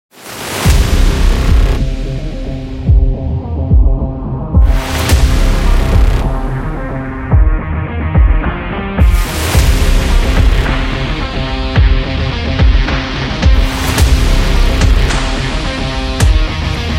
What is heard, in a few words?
Stadium Rock Trailer